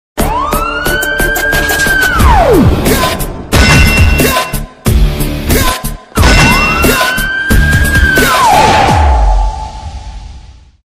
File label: teaser bgm; teesmaarkhan